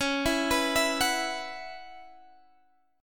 B Suspended 2nd Suspended 4th

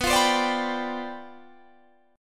C Major 7th Suspended 2nd Suspended 4th B, chord

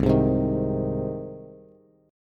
C Minor Sharp 5th C down Bass; strummed; JGuitar

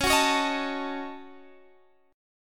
Gb Minor 6th Db

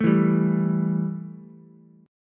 E Minor Double Flat 5th Ukulele; strummed; 2,4,3,x; JGuitar

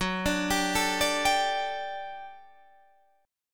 G Suspended 2nd F sharp, Guitar; Gsus2/F#; arpeggiated

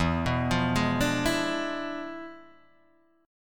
E 7th Flat 9th

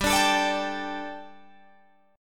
G Suspended 2nd, Guitar, Drop D; chordshape; down

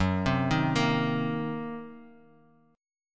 Gb 6th Flat 5th Gb down; arpeggiated; Gb6b5; JGuitar

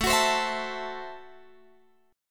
A Minor 6th A Guitar Drop D

